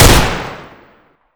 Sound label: ultimax; garrysmod